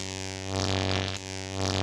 electricity; spawngirlsounds

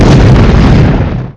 las exp, ired, weapons; zaero

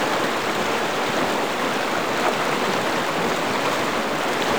current, outdoors

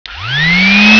spinup; weapons

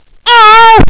fall; bong

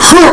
jump; player

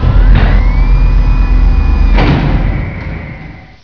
door; shadowfire

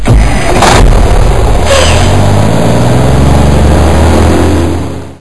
truck; baseq2

